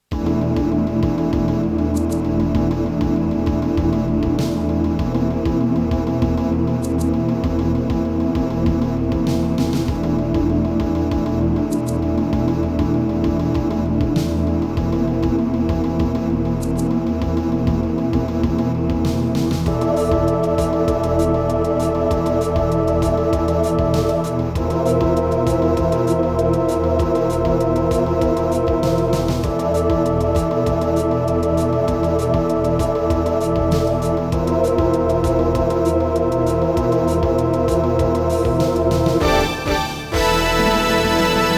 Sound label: Gravis Ultrasound MAX 16 hardware; soundcardrecordings; Abuse